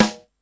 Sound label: subtle reverb snare one shot b key